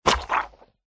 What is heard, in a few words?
cartoon squelch wet drop; zapsplat